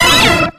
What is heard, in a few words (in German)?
Ruf